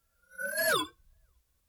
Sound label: electronic zing pass by swirly